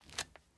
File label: masonry jump; teardown ps5 windows xbox series xs gamerip 2022